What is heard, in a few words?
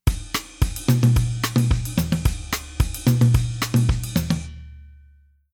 Rhythm Latin